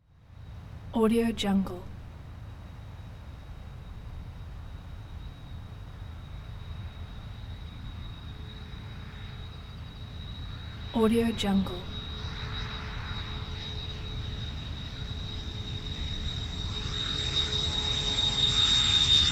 four engine turbo prop plane landing; hollywoodedge

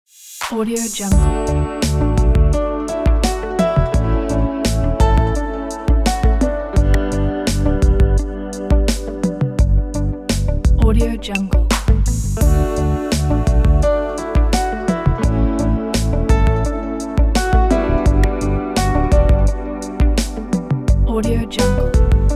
phone holding ambient; ocean-studio

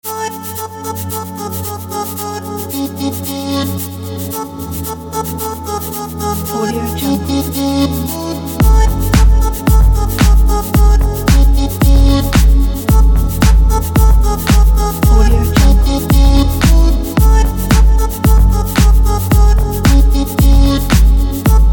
lounge dark chill house

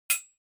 weapons knife hit metal

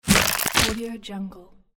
break the body in half; tibasound

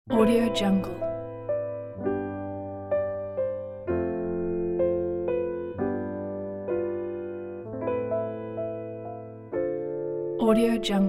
lonely piano

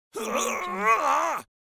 male grunt

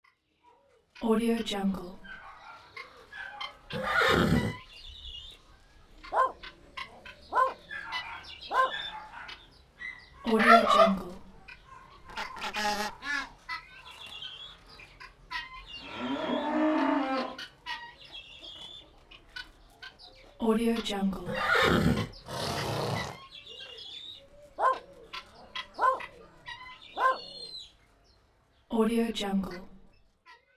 village countryside ambiance; alexm76

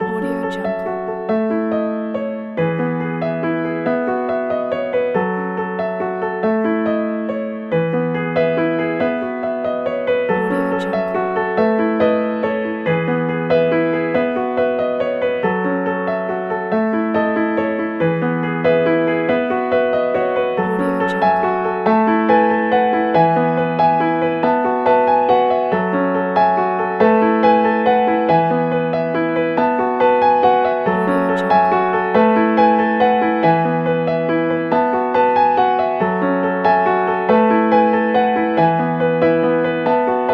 modern classical piano and strings

